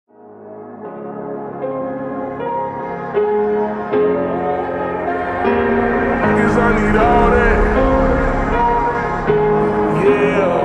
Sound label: Slowed Reverb